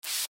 Bottle Spray, Bottle Spray Single Squirt Fluid Bottle; Spraying; Objects; Blastwave; Foley